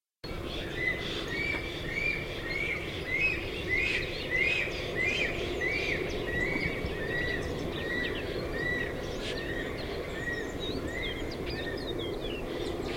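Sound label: black stork voice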